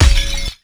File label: Kick, Ensoniq ASR-X Pro